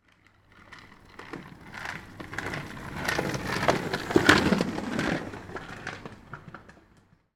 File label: leisure scooter plastic pass pavement fast